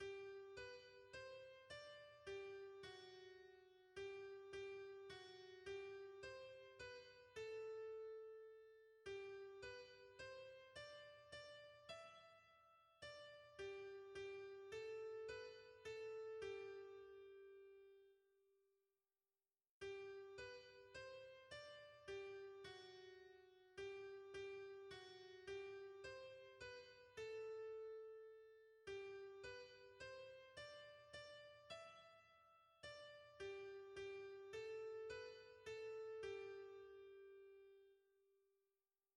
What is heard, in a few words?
soprano; Jesus ton nom rappelle; hymns